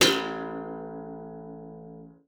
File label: A1, GUITAR; Xsample VOL-2